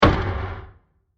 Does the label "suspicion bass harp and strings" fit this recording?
No